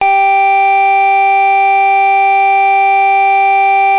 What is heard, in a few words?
Tone Long